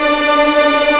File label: Vln Eb; BLOOD2; Ancient